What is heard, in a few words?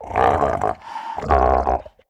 pain, procoptodon; zoochosis pack